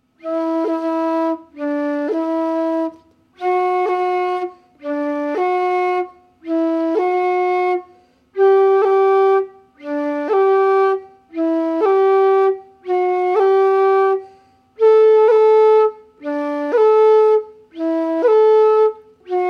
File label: cut, irish flute